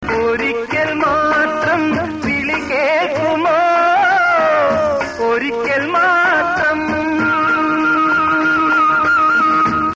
old malayalam, ringtone

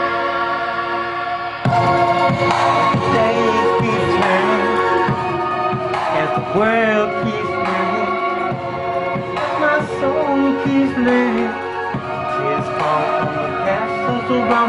riff, rock

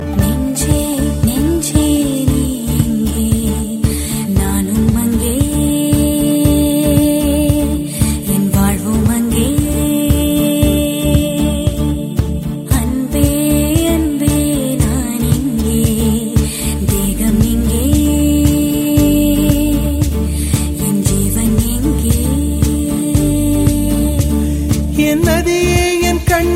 instrumental; christian

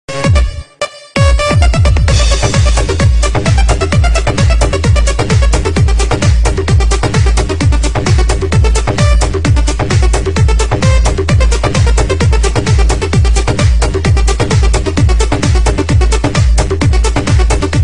techno

